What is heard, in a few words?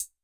Closed Hat, Old Skool House, 132bpm; Big Fish Audio; Marshmellow; Construction Kits